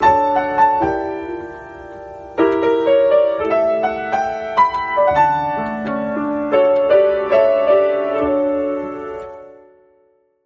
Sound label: romantic piano tune